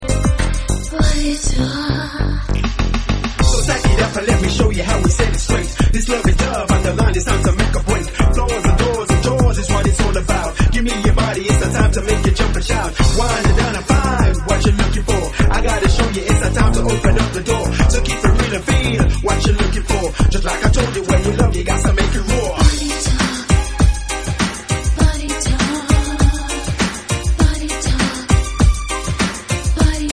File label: ragga beats mix